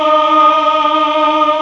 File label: MELLO CHOIR